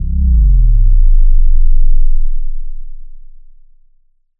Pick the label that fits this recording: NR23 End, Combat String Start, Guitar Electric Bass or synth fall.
synth fall